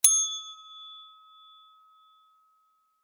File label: hotel bell ding